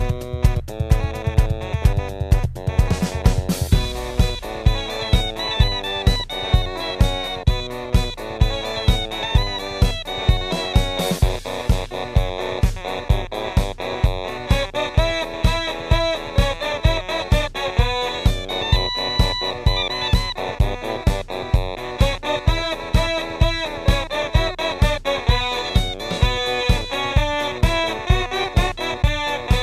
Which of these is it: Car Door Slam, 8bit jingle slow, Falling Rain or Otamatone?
Otamatone